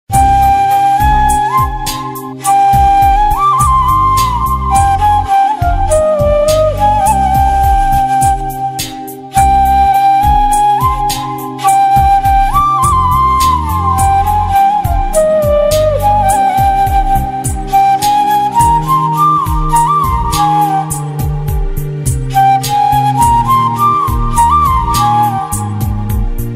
song ringtone flute ringtone south indian bgm ringtone; alaipayuthey